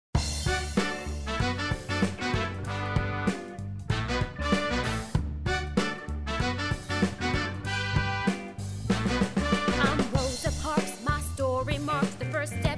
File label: Singing